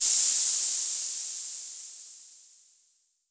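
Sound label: Olson Cym A, cymbal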